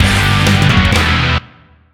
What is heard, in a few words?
Music Sting; Menu FX